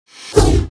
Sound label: ATTACK